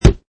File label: Impact Wood, Wood impact. Muted thud of object hitting wood; Materials; Lynne Music